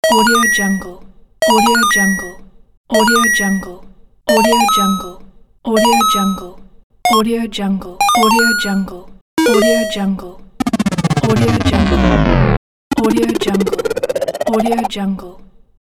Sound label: video game coin pack